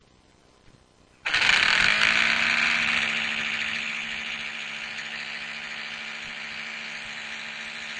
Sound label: toshiba 60gb loud grinding